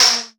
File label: perc mod hell